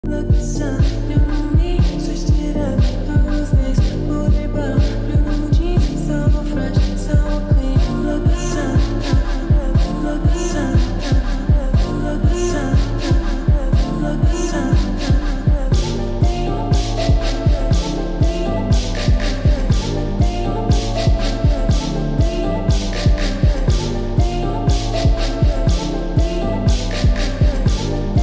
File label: jersey club flip slowed reverb